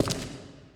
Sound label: stone step; Golden Crayon Sounds